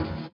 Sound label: SWUSH; Spectrasonics; Metamorphosis